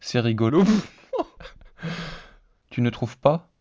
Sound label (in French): rire synthese contextuelle